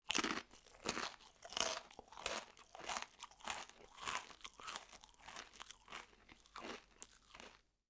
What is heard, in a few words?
crunching; human